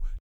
BOOGAROLL, Vocal Blues Soul; Spectrasonics Vocal Planet

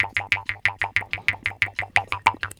KHOMUS

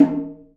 DRUM; E-MU Producer Series Vol. 5; 3-D Audio Collection; 3D Multis; 3D Sprint Select 2

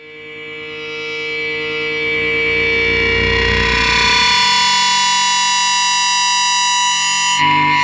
Feedback, Industrial; Nasty

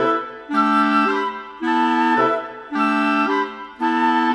Winds, Orchestral; Sonic Foundry (Sony Creative Software); Orchestral VOL-2; Modern 21

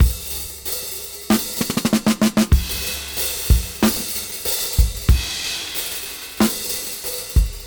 Half Time Slow 94 BPM

